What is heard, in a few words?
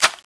clip out; tfa cso; m1918 bar